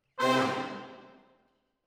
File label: Horns Over